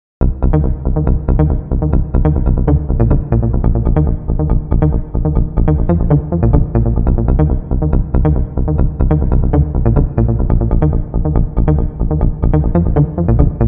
Bass; abx3 trance presets vol1

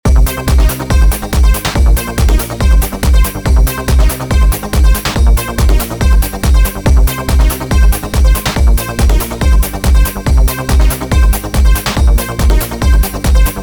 Bass and Sting Lead; Nouga; neo retro 8 bar loops and midi files

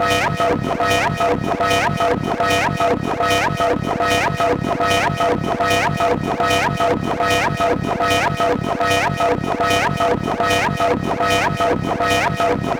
Alarm, acid; 100 acid vol 3

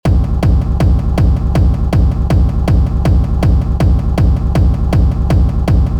hard techno kick; busy tortoise; hard techno kick generator

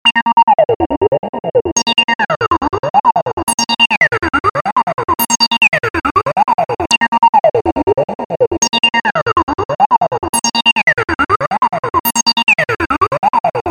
trance; Falling-Dump; ABX3 trance presets

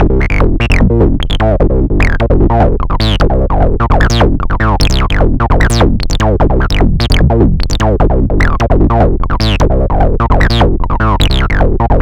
Gentle Bass; acid techno trance vol2

